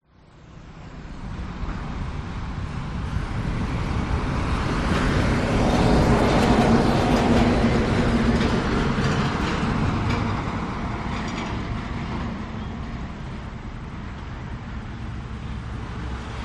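public places gas station trucks cars pass person fills up motorcycle away at end; vietnam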